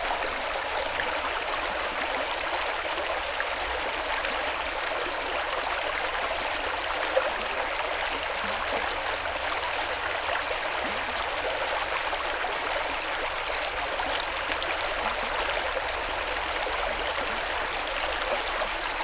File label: brook